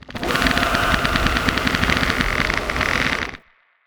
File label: escape